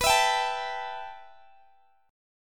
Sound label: B 7th Suspended 4th Sharp 5th B down Guitar Open G